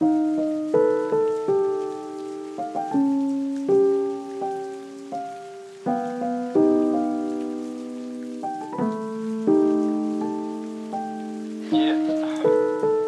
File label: Whole tone scale lofi vibes